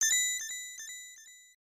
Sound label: Title Jingle; Space Invaders; Super Game Boy; SNES